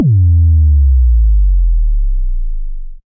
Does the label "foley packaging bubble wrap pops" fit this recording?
No